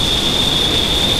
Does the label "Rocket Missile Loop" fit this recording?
Yes